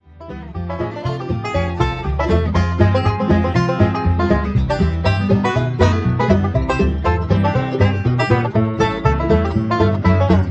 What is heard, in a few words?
banjo, bluegrass